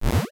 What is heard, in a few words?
pickup; burutaru